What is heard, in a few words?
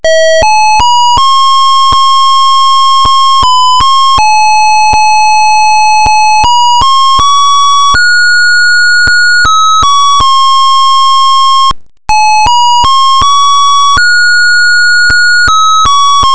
melody